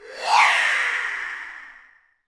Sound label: PRC Air Whistles; NorthStar - Global Instruments VOL-2